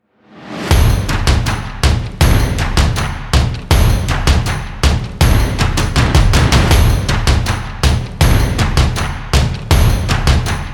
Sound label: Epic Percussion Stomp; energetic